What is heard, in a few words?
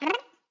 Poke Sync; talking tom cat 2 android ios online gamerip 2011